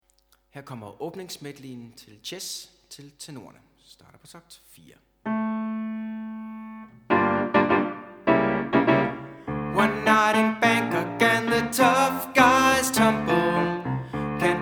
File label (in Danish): Tenor